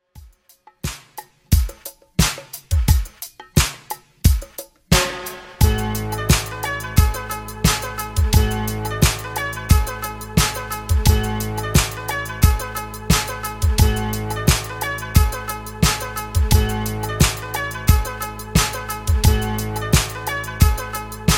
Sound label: Dj Intro Outro 88 Bpm